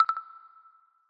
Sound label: notification light oneplus 5